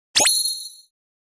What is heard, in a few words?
Sword draw shine